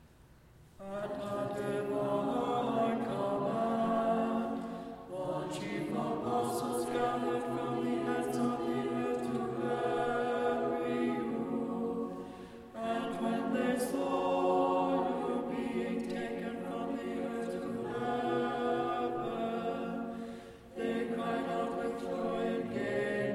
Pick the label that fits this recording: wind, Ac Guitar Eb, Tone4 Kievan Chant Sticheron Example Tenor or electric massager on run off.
Tone4 Kievan Chant Sticheron Example Tenor